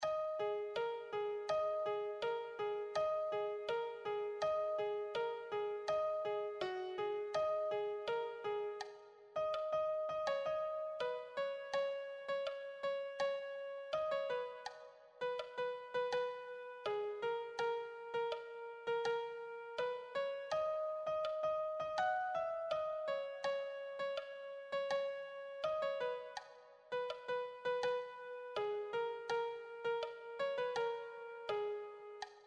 melody

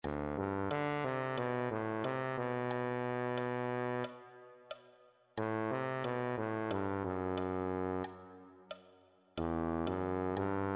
euphonium

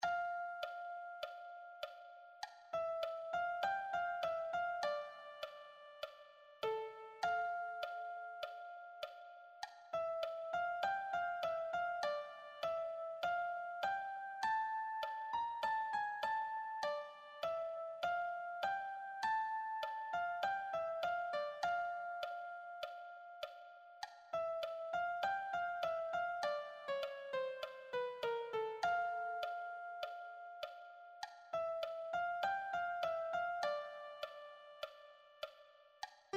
melody

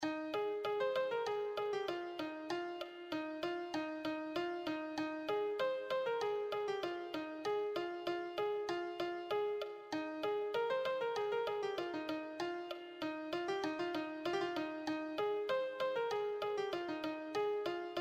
lead, piano

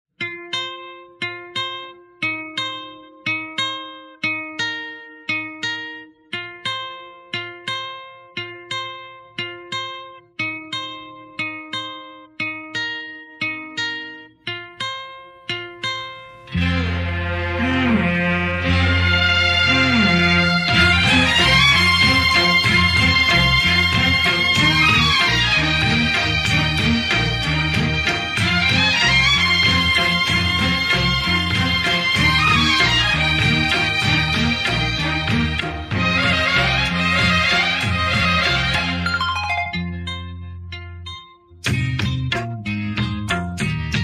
backing track

